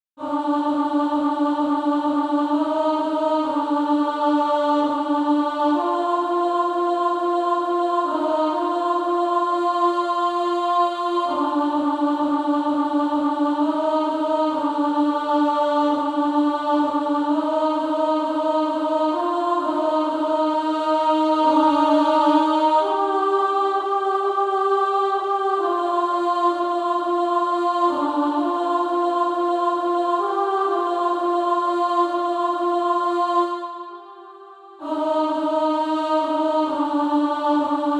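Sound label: Female Choir, alto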